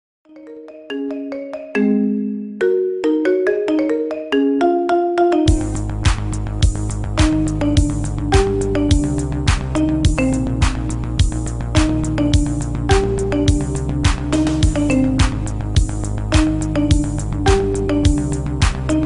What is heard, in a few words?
Is Calling Pop